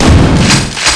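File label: super shotgun; quake2; coop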